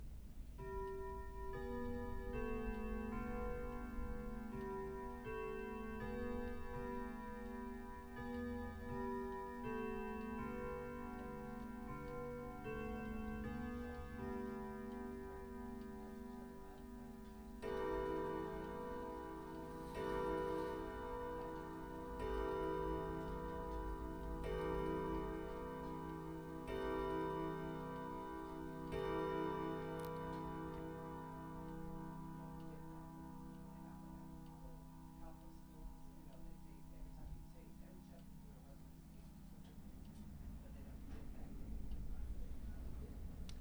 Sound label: Clock Chime; Berkeley Suburbs During Thanksgiving